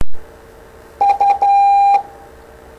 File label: Morse U